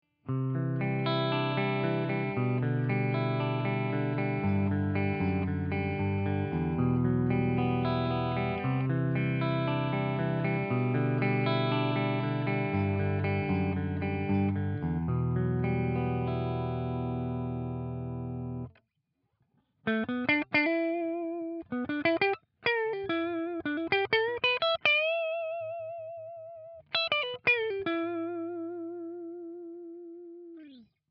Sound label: Gibson LP clean beide